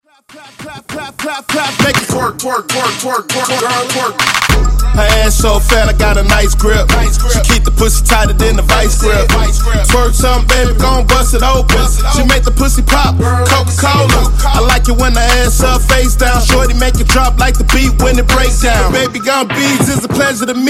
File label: Twerk Funk Twerk Dj Intro Outro 100 Bpm